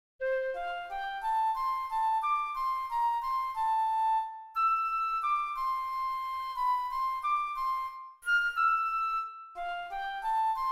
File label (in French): Flute; Kantik Sant Yan